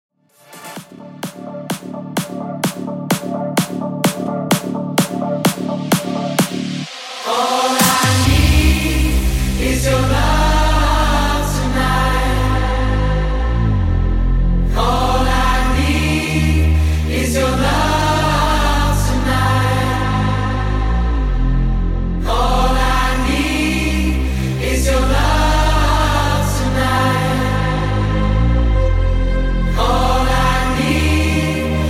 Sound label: Big room Edit 128Bpm